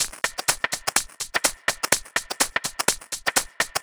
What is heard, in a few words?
Swing Loop, Drum Loops 125bpm, Nu Dance Electro; Zero-G - Soundclash; Swing Injection